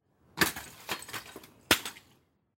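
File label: scooter fall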